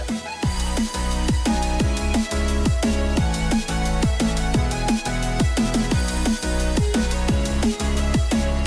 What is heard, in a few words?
music; furious jumper